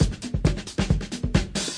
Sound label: 134 drum loops and breaks; 131 to 140 bpm; samples-ghost